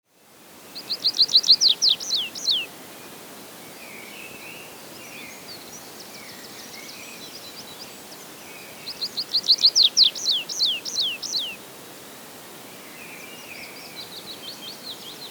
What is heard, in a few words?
Tree Pipit